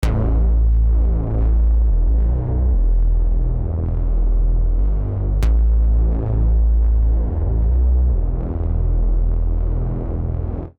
A Minor 87bpm, Bassline; MIDI Clips; Grey Panel; Ableton; Factory Packs; Chop and Swing

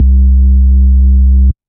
Sub Stack, Bass; Ableton; Glitch and Wash

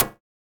Alien FM Perc Short; Ableton; Noise Garden - Augmented Percussion; Alien FM Perc Vol. 1

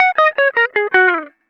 080 W-Coast HipHop Guitar F#

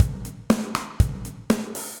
Kit Dark Plate Verb, Drums; MPE Kits; Ableton; Factory Packs; Session Drums Club

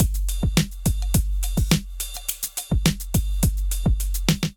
Fairfax Kit groove 105 bpm, Drum Essentials; MIDI Clips; Ableton

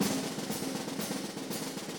120 March Fast Drums

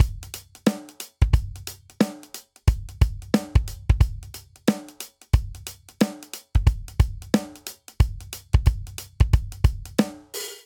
Swing Hip Hop Verse Variant 90 bpm; 4 4; MIDI Clips; Ableton; Factory Packs; Drum Booth

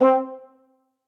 Short Staccato Brass Quartet; Ableton; Spitfire Audio; Individual

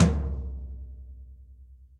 Tom Floor Classic Muffle; Drums; Drum Hits; Ableton; Factory Packs; Session Drums Studio; MPE Hits

